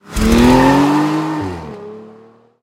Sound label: freespin Car; hotline2